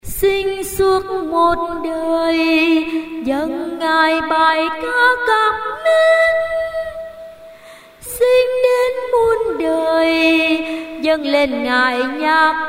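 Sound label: soprano tenor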